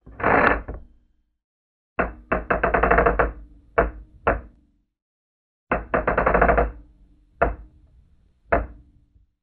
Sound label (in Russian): mehanizm hrapovika